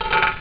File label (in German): wecker; Amiga Soundtracker Sample Packs